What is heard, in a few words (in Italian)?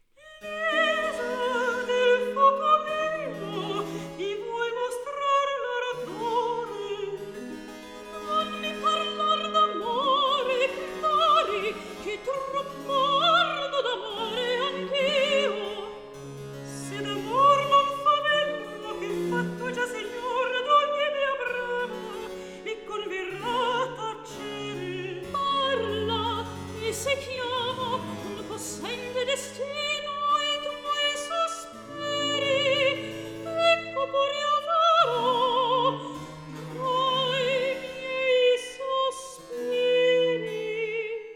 Recitativo; Cantata 'Lisa del foco mio' (Clori, e Lisa); Alessandro Scarlatti; Duet Cantatas